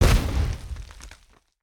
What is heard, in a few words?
Explosion Rock; Treasure of Nadia